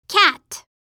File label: cat